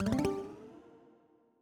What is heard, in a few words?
table bet grow; fruitblaze; netent